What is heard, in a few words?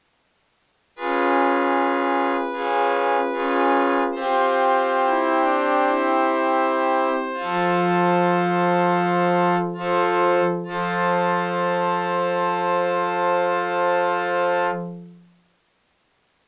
cadence